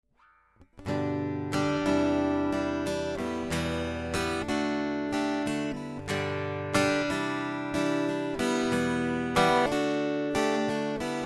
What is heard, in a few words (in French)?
guitare, cordes pincees